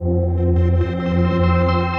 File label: Fractured Pads, Ethereal Pads; Ableton; Mood Reel